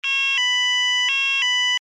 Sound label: alarm tone, sounder alarm tone; sounder beacon